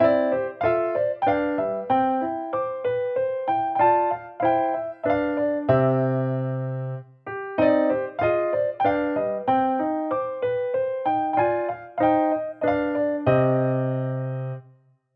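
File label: piano major minor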